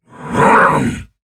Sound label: Attack Effort; Warframe; Dax Heavy; Battle Barks